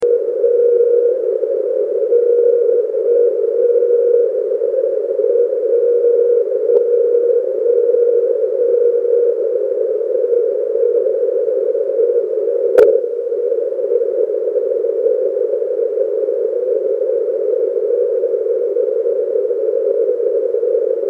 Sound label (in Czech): majak; SuperQRPtest; Maják 9